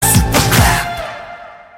Super Clap

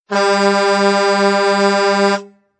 Horn

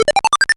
Rocket Ammo

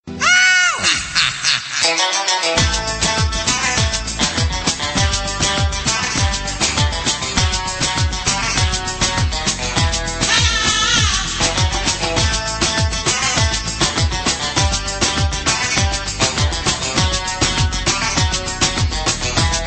Gitar Tune

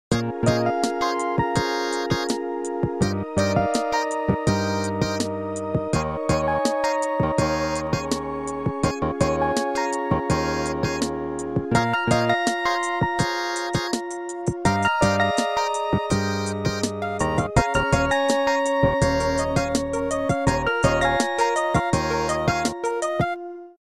Exotic Motorola

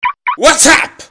car Lock; Whatsapp

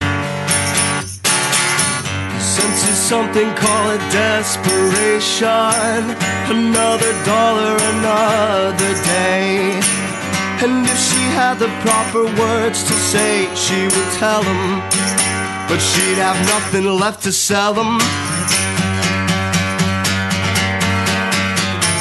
acoustic

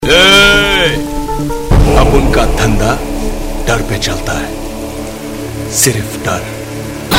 Shootout Sms

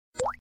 water drop

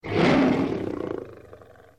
Lion Roar

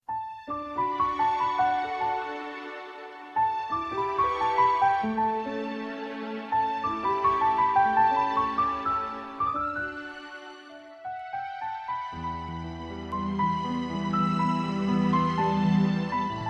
Melody Instrumental

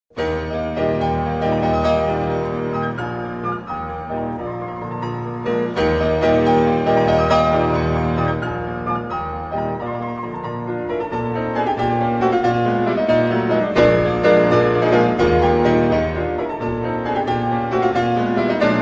Soft Piano

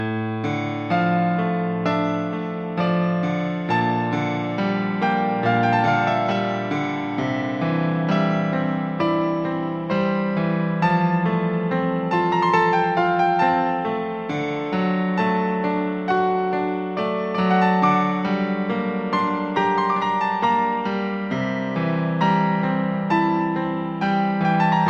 Slow Piano

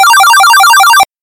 Gigaset Tone

